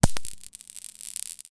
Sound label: Tactics Shocker